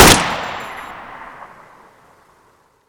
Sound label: aks74u